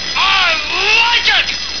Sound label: ROBO